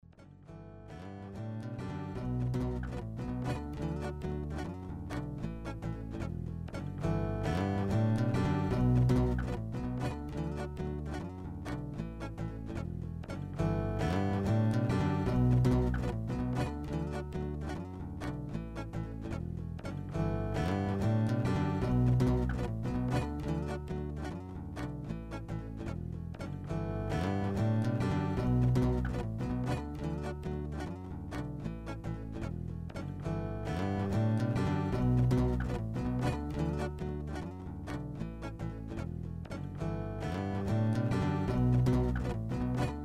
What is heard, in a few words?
a beat for every string